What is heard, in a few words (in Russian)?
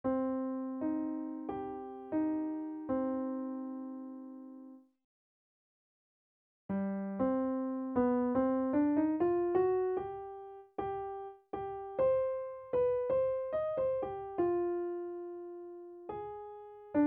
Диктант для 8 класса (до минор)